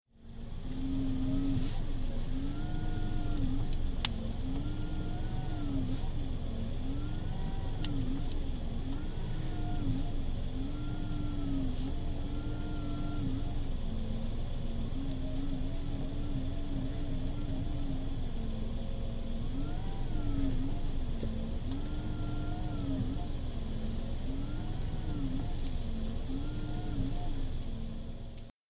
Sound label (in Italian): sterzo